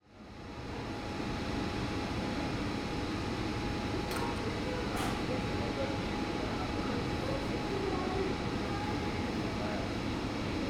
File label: Hannover Train Station Electric Locomotive Idling Distant Voices; Bolt; Berlin Vignettes - Ambiences and Sonic Curiosities